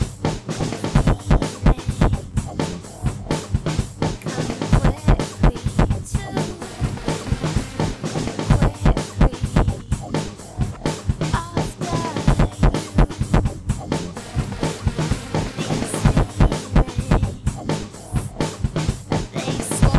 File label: percussive